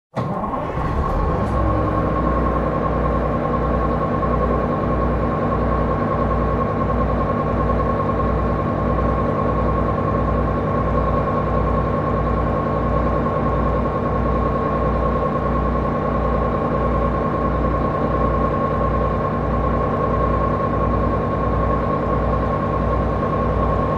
zvuk v kabine avtobusa

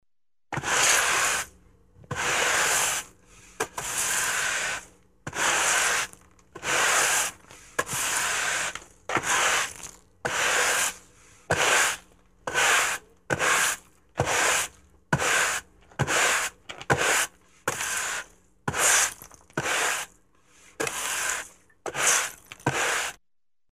zvuk schetki dlja pola

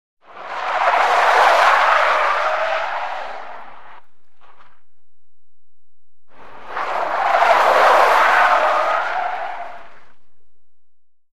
kolesa pri drifte

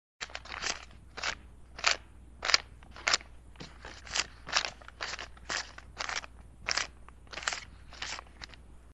zvuk deneg

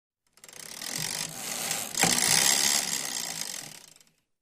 treschetka velosipednogo kolesa

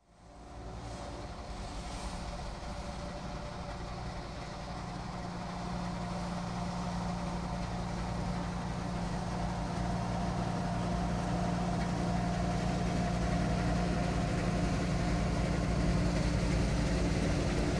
zernouborochnyj kombajn

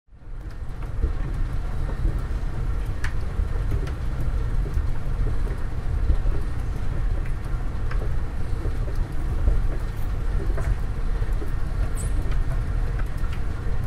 rabotajuschij eskalator

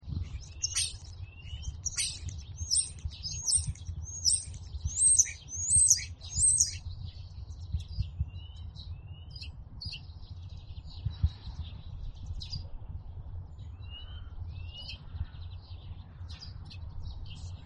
golos obyknovennogo skvorca

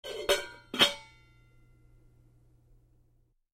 banku zakryvajut kryshkoj

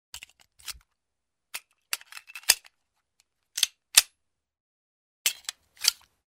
pistoletnyj zatvor

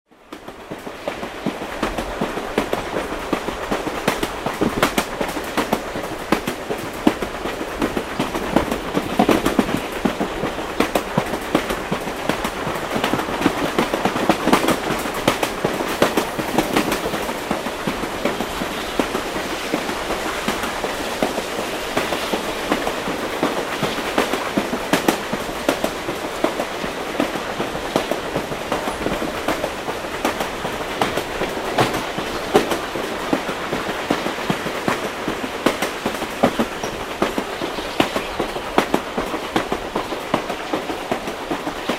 stuk koles vagona

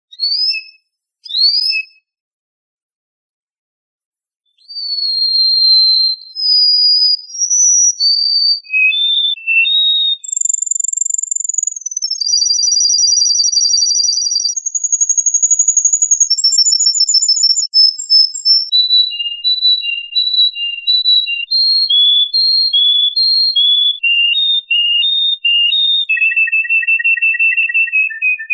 pesnja kanarejki; 3D звуки

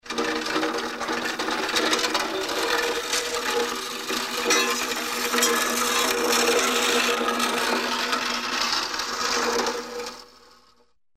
glushitel taschitsja po doroge

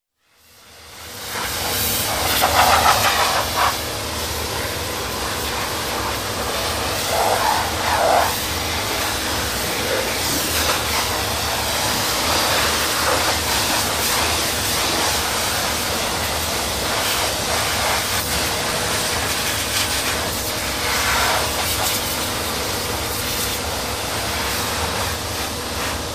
ochistka struej para

